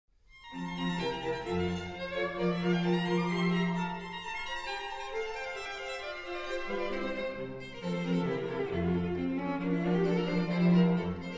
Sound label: String Quartet; String Quartet No 23 K 590 Movt 4